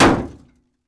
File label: door impact; hl2 ep1; ambient, levels, intro